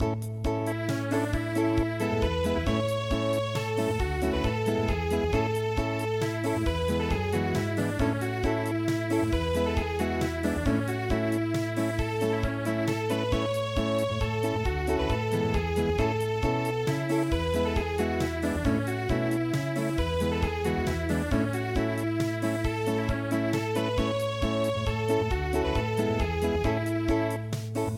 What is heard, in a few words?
Unplugged